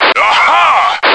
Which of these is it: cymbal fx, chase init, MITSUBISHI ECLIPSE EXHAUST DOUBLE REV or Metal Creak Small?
chase init